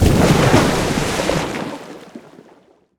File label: Water Spell Impact; AudioWizards